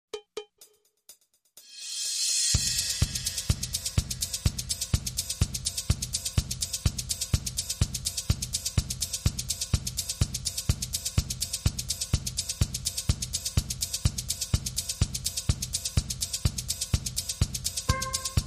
Calypso Loop

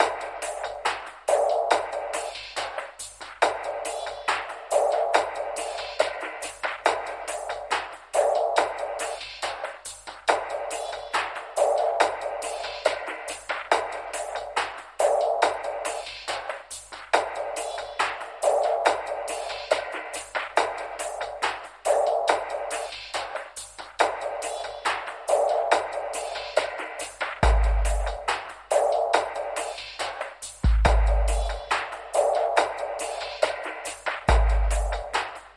No Piano

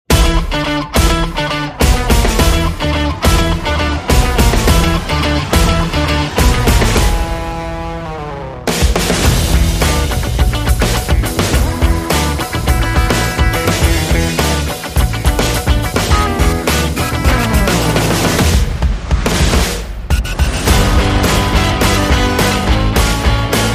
Punchline, No Horns

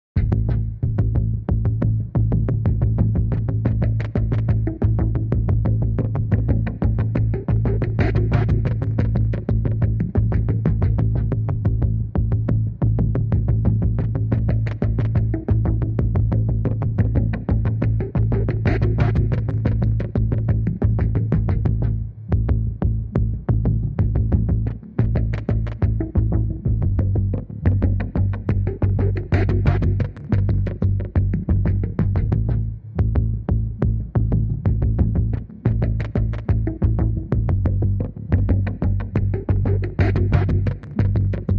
Pulsing

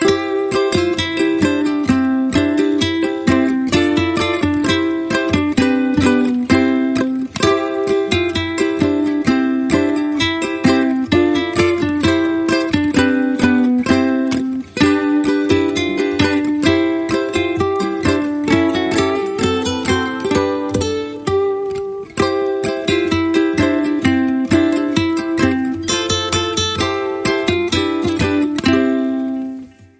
Ukulele Guitar

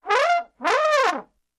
Trombone Wolf Whistle

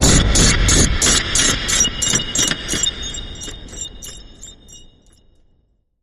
Metallic Stutter Rattle Impact

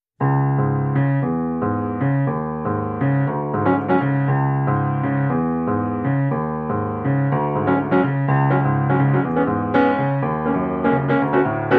Piano

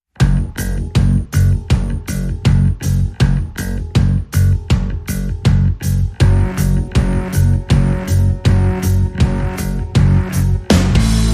No Solos No Horns

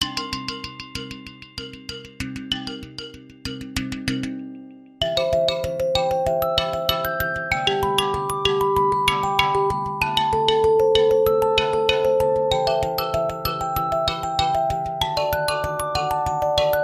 Suspenseful Marimba

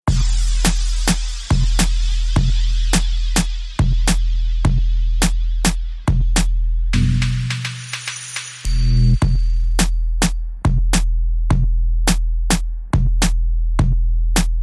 Circles Beat

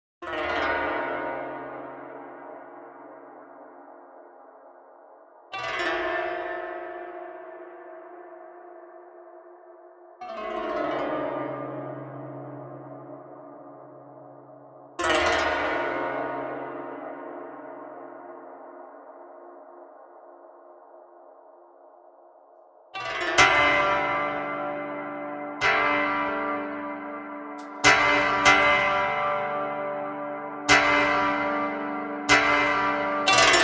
Koto Only; Hattori Hanzo

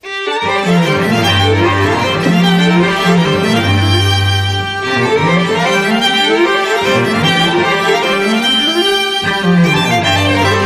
Strings Only

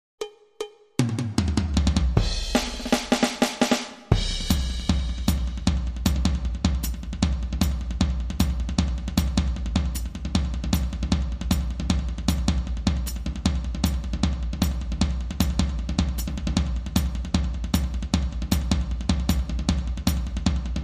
Surfer Loop

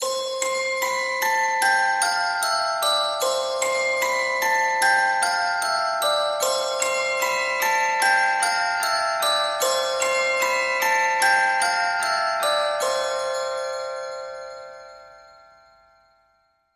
Holiday Chime Atmos

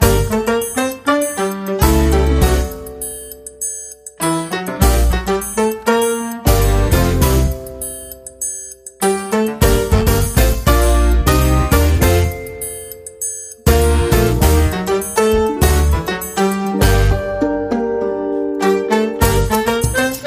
English Samba

